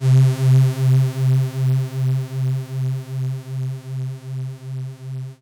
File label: SYNTH